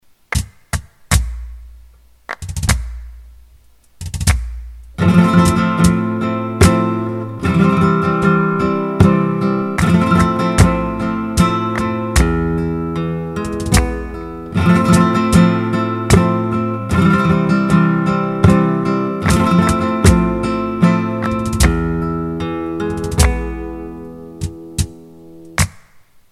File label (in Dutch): Soleares compas met rasgueados